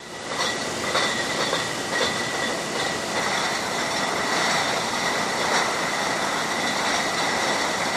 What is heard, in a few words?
Bottling Plant Loop, factory